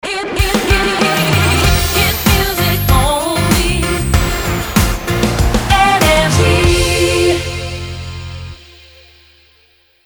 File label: Jingle Shotgun; NRJ